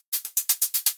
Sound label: 122 BPM Hat; Premium Hat Collection; mmb studio melodic